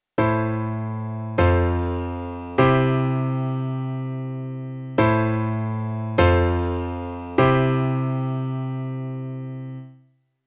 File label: Three chord progression example